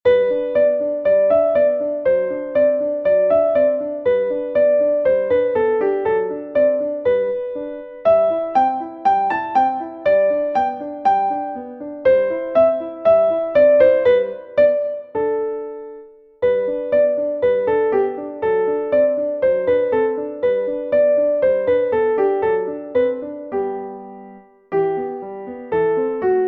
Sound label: Bagatelle in G major; Level 2